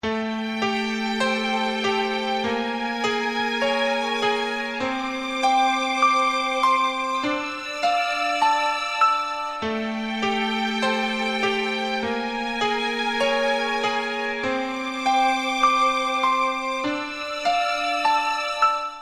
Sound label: Nervous Background